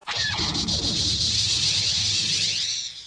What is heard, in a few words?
tie laser